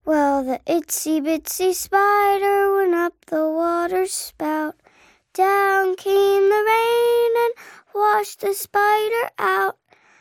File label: Little Girl Singing (Itsy Bitsy Spider) Loop; Silent Hill Downpour